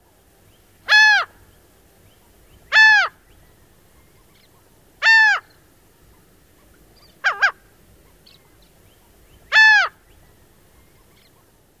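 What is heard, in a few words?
Laughing Gull, typical kyow calls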